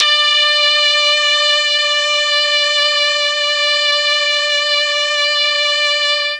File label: D4 brass, MKII mellotron